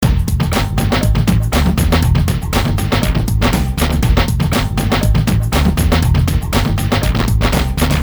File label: depressing rock music